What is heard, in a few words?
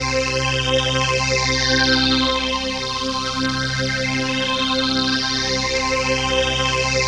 PHASE PAD, ATMO PADS; Best Service; XX Large